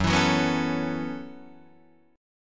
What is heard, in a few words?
Gb Minor 11th Gb Guitar Open D